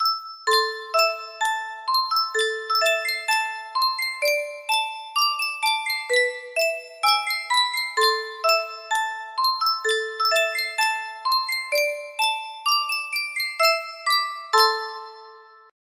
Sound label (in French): sankyo boite a musique, music box melody